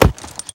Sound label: horse hoof; smite; guanyu